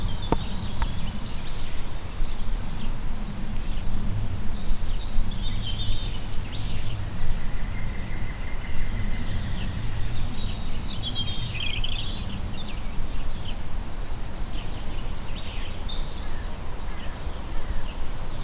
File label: Birds In The Garden